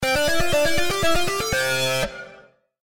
fire fill 120; Adventure Time - Elemental; ThrillGames

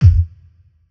drop; Zombies Layer Viking

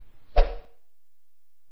throw; Hanuman Return

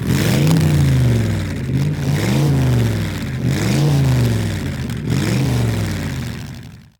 Rev Multi, Truck; Nickelodeon - Destruction Truck Derby

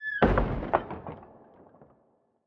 door close; Lux Ahoy

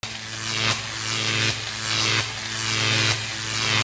Electricity; Bunnicula - Crop Control

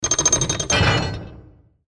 gate; Keep Out! A Dungeon Adventure for the Brave

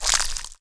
splatter small; Fruit Slicer

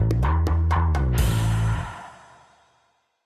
chapter complete

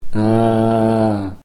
zombie moan; Dark Village

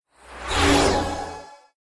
player fear; Adventure Time - Break The Worm; ThrillGames

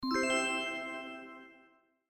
Rainbow collect; Dora and Friends - Legend of the Lost Horses; collectible

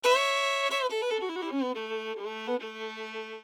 game over; Adventure Time - Elemental; ThrillGames

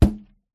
balloon hit; Nick Summer Sports Stars

